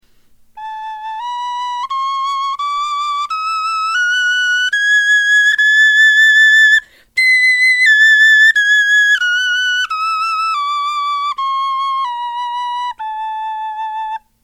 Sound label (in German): tonleiter, knochenfloete